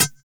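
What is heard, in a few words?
tek percussion lo; Drums In Your FACE!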